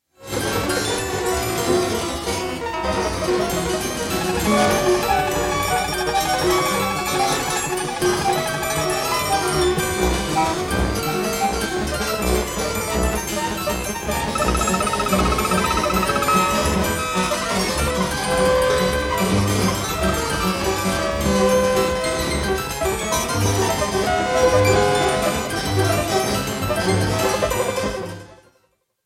harpsichord and computer generated sound tapes; hpschd

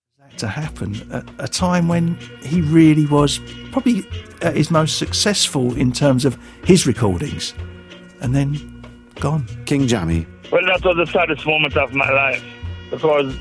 noisrc sound dimension drum song

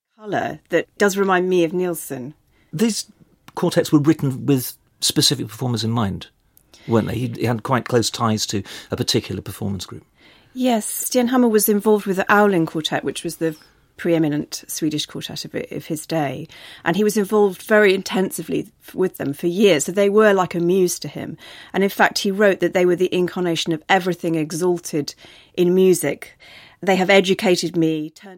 string quartet no 5 in C major serenade; wilhelm stenhammar

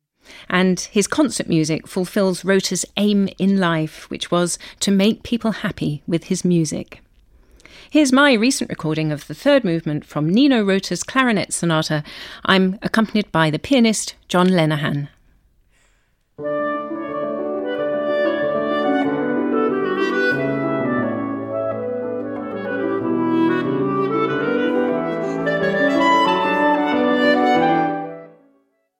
ensemble, clarinet sonata; nino rota; clarinet sonata 3rd movement

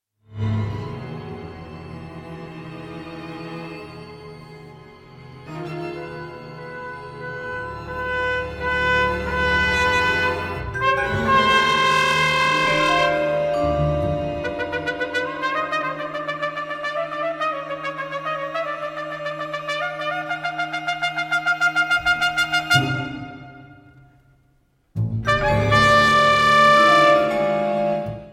for trumpet and orchestra; sir harrison birtwistle

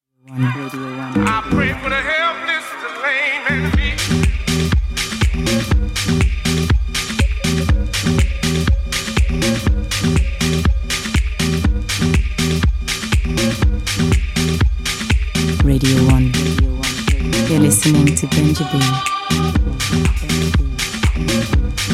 drum ensemble

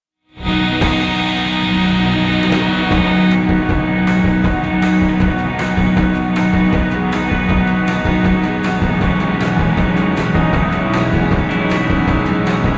glockenspiel

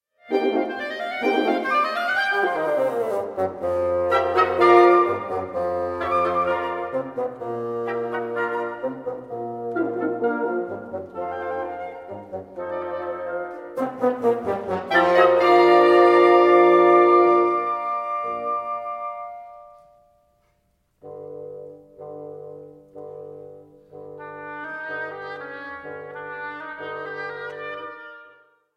suite in b flat major for 13 wind instruments; Strauss

